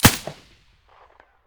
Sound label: silencer; S.T.A.L.K.E.R.; IWP; vityaz; pp1901